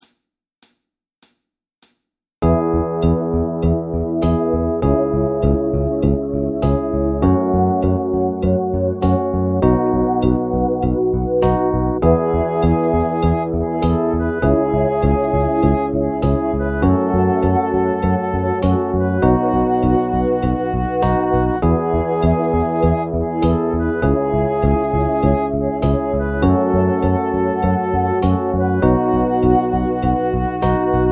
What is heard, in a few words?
Drum Grv Med